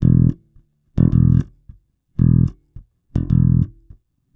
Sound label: 110 Pop Basic Bass E, E-Bass; Pop Rock